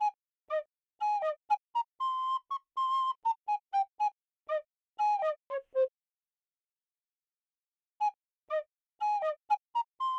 melody; game builder garage 2021 switch

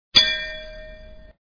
bell; pencak silat 2 2002 gamerip online

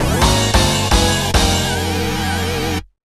Jingle Lose; Game Center CX3 3 Choume no Arino; 3DS

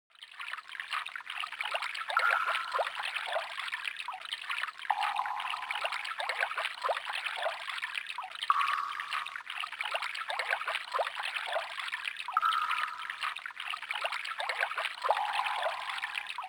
Ambient Cave Stream

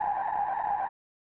Splat!; Frogger; SNES

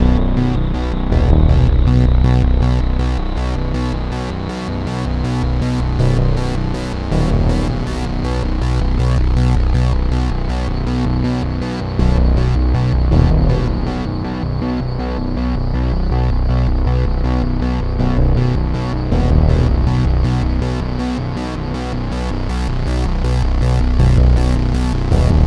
tension rising loop; the warriors 2005 ps2

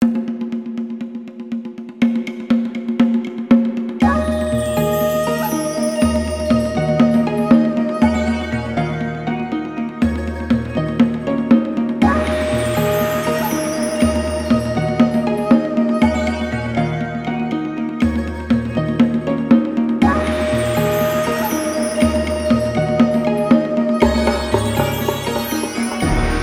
challenges japanese splash; Gangland

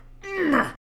argh

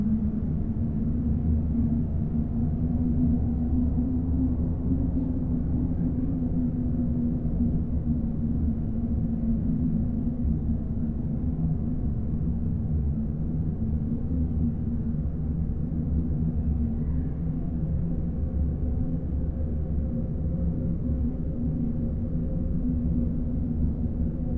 Background Generic space; android game music; angry birds star wars

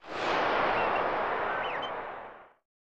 Cheer; Extra Innings; Hakunetsu Professional Baseball Ganba League; SNES

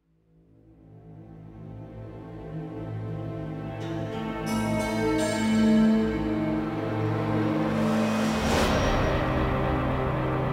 Spooky; Arizona

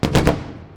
Fireworks Crackle

